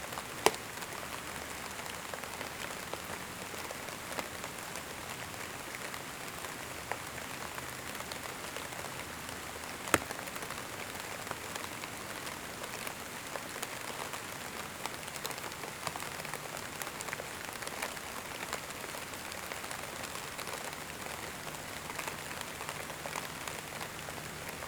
Classical Study Music Sounds of Nature White Noise